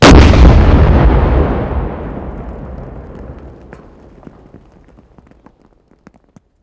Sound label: Vehicle Weapons